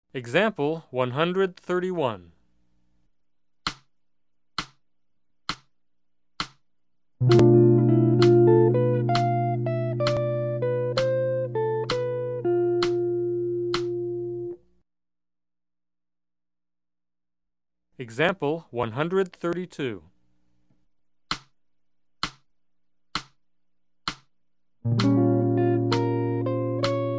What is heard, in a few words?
Jazz